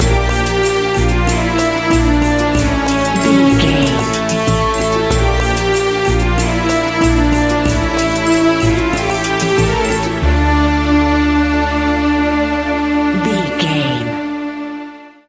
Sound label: An exotic and colorful piece of World music. Groovy, powerful, exotic and wild.; Sun Worship